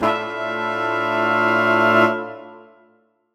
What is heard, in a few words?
Horn Swell Asus4min6; undercover samples